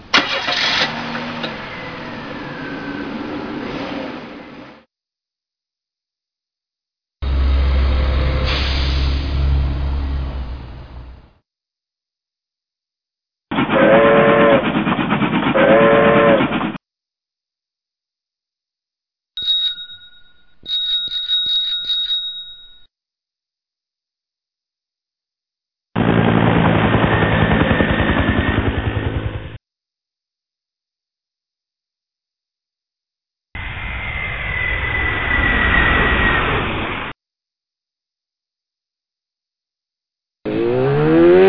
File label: noises in town